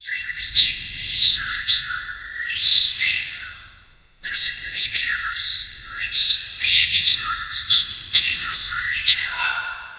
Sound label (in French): chuchotements lugubre, whispers